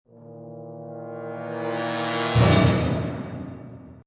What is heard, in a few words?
compressor before